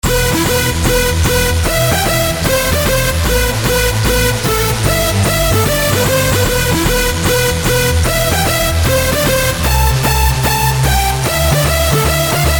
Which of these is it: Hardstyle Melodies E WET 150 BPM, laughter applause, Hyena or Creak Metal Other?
Hardstyle Melodies E WET 150 BPM